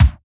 FAT E KICK; Drums In Your FACE!

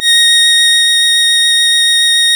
Synclav FM STRINGS A#; Big Fish Audio

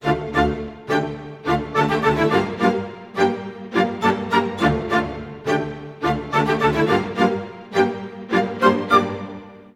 105 d min full mix, Orchestral arrangements

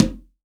CONGA, STEREO CONGA

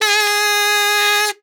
KAZOO A4; Xsample VOL-9

